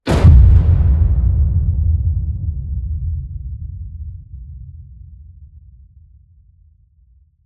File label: HIT Low, Cinematic Hits Designed